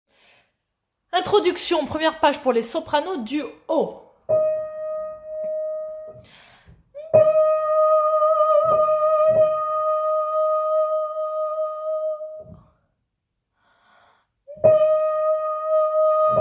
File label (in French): sop du haut; Kyrie intro